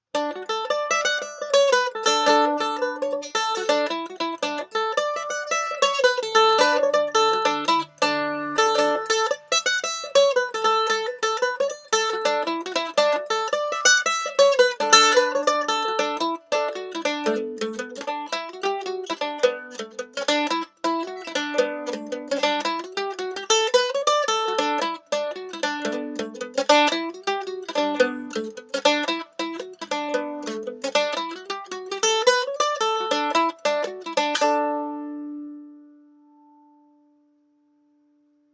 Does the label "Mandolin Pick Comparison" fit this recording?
Yes